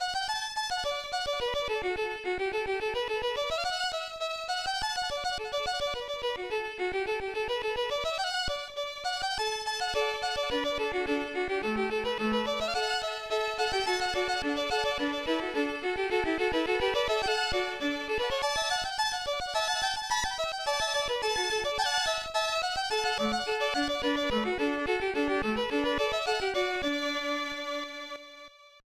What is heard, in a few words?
Little Fiddle; Sid Meier's Colonization; amiga